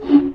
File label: barnacle grunt; hl2